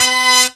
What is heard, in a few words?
Doiky; Mo' Phatt; Digital Sound Factory Mo' Phatt